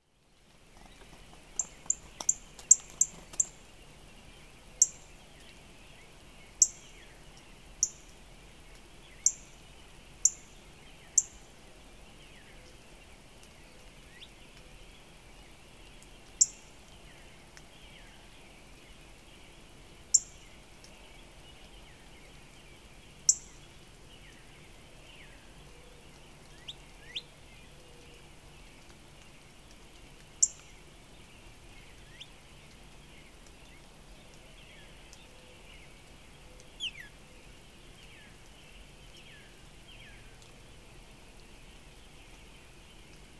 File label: Northern Cardinal